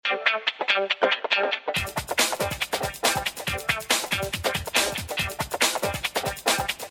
Hip Hop right; Samsung; GT-M2710 BeatTwist India

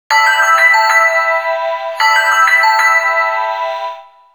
Glitter tone

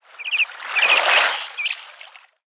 Sea Bird

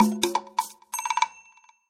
Percussion

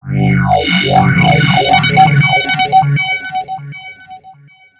fx; ringtones; Google; NewWave Labs

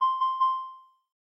Low Battery In Call

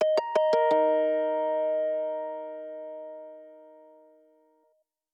Harmnonics, notifications; Vestel; Venus

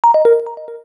Back, key tones; Samsung SGH-A257

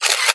camcorder capture; Samsung SGH-i560

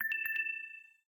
Low Battery; LG; G3-S

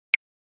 Chat alert, Nokia phonetones; Nokia E7

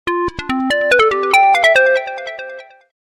Digital Bird; Pantech C740-Matrix

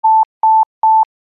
clock, Nokia

